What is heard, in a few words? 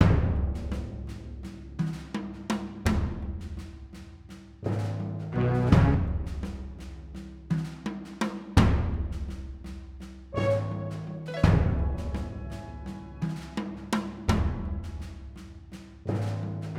Town Music Dice Tray